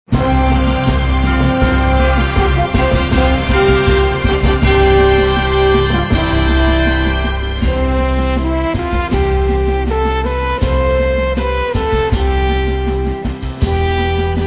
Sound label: Instrumental Hymns